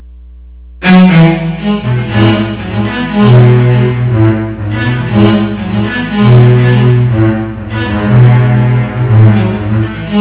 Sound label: quartet